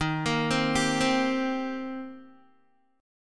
Eb 6th Eb down Guitar; arpeggiated

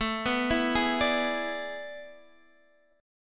A Major 7th Suspended 2nd Suspended 4th; AM7sus2sus4; arpeggiated; x,12,9,9,9,10

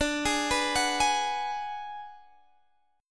Ab Minor 7th Flat 5th D

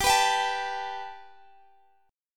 G Major Add 9th; Gadd9 strummed

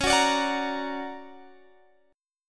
D Augmented Major 7th, chord

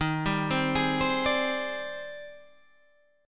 Ab Major Sharp 11th Eb

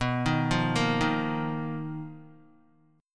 B Major 7th Flat 5th B down Guitar; BM7b5; arpeggiated; JGuitar

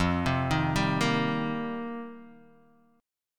F 9th Suspended 4th, Guitar, DADGAD

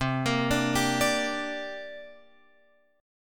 C 7th Suspended 2nd, Guitar, Open F; C7sus2 arpeggiated

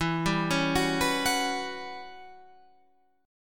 E Minor 6th Add 9th guitar chord, Open F; Em6add9; arpeggiated; 16,14,13,13,14,13

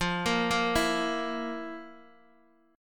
F Major 7th Suspended 4th, Guitar, Open D

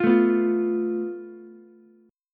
F Major Flat 5th, Ukulele; FMb5 strummed; JGuitar

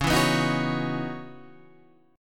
Db Minor 11th Db down Guitar Standard; Dbm11 strummed; JGuitar

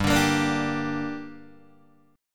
G Minor G, Guitar, DADGAD

